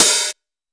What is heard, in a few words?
Boss DR880 hihat